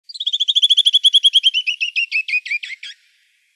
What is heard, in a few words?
Canyon Wren